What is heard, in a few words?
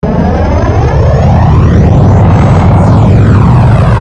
jetpack